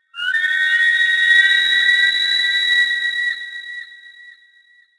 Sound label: eagle; ambient; map mountain; World of Tanks Blitz